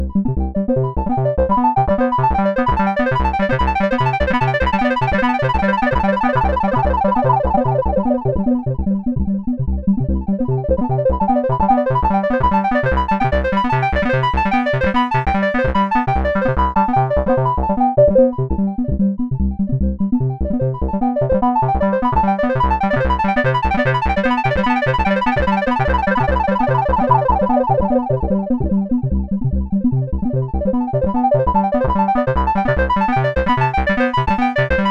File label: acid raga latangi